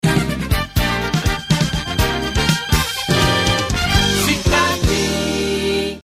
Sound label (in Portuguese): Vinheta; Cidade FM